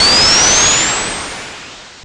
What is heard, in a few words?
SPINREV; Sonic R; Windows